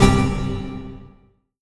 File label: GREEK HIT C2; Best Service Dream Experience